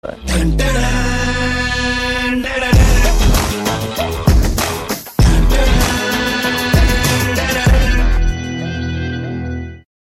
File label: Guitar Loud; Kaminey